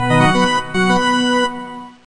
ballgame, loops; Biblioteca de sons; sampleswap

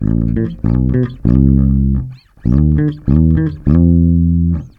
slide, baixo; loops; loopasonic; Biblioteca de sons

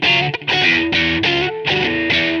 mucky slop, loops guitarra; loopasonic

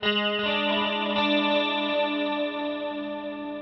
space pick, guitarra; loops; loopasonic

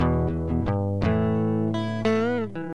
walking home blues, loops guitarra; sampleswap

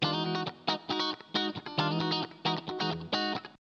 basic funk, guitarra; loops; sampleswap

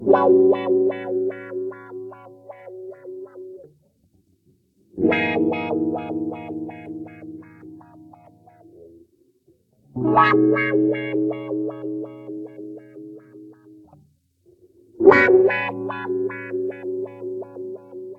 master wah, loops guitarra; sampleswap

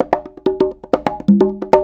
bongos; loops; Biblioteca de sons; sampleswap

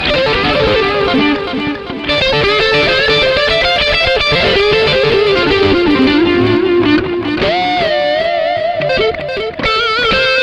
fiddling, guitarra; loops; sampleswap

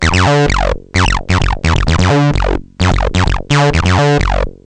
dist 303, loops sintetizador; Biblioteca de sons; sampleswap

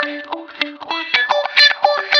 comp wah thing, guitarra; loops; loopasonic

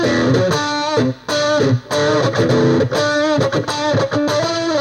mess, guitarra; loops; loopasonic

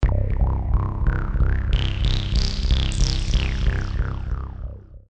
analog bass riff, sintetizador; loops; Biblioteca de sons; sampleswap

